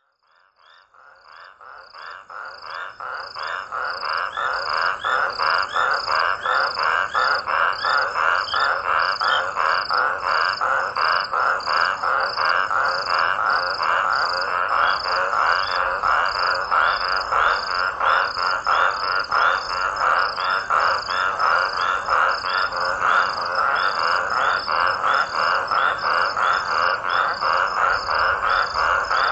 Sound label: raucous toads; Madikwe Toads; smallsounds